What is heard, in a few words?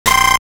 laser; famiconse